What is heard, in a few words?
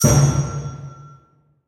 max Bet Button Click; jingle spin